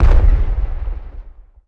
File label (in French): Explosion